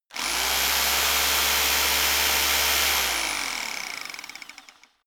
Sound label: rock drill chisel burst long; schrauben drehen hydraulik